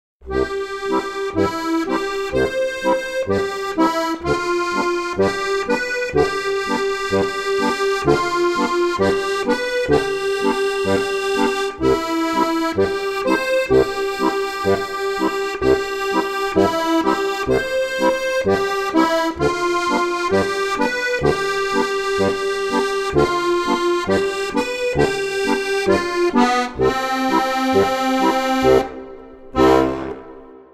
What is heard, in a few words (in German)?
harmonika